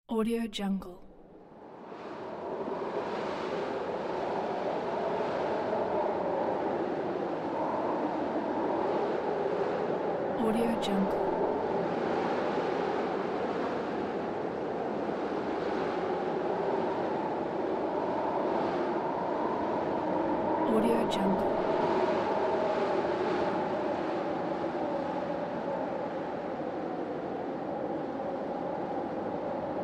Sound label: hurricane